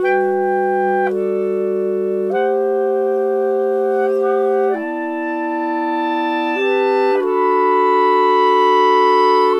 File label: 100 G Calm Clarinet Layer